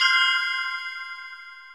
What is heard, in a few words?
spinner bonus; osu-lazer; old-skin